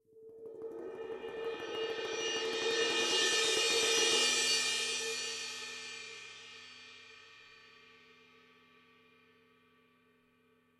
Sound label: ride roll, gretsch kit